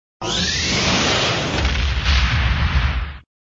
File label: Sound SFX, flipper; sttng